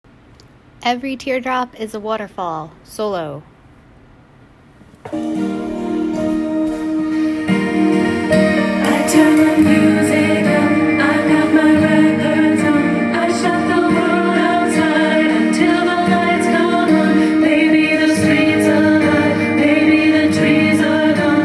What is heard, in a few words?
teardrop solo